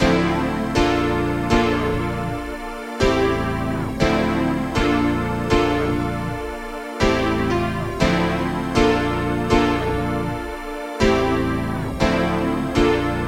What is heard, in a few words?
120bpm D min